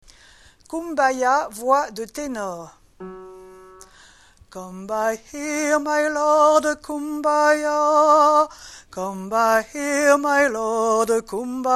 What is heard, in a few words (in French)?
tenors, Gospel voix par voix; Kumbaya